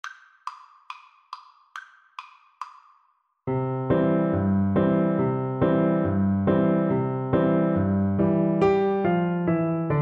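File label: viola karaoke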